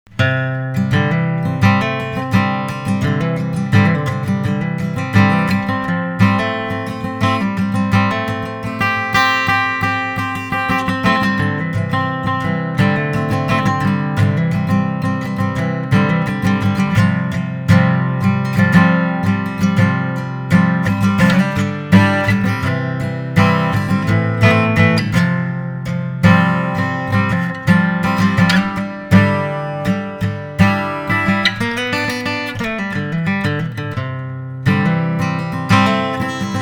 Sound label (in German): XS Saiten Mikro; DAddario